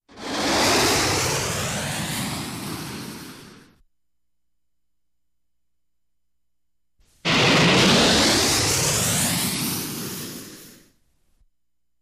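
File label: Rocket Whoosh Medium, whooshes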